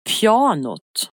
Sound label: piano